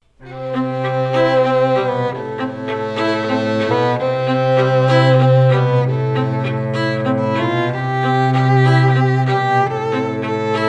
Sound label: TRIO; hallelujah